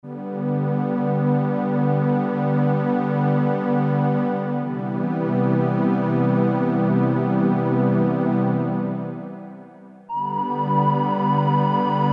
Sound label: Split - STRING ENSEMBLE, Oberheim OB-8; Splits & Doubles; (U) ST. GENEVIEVE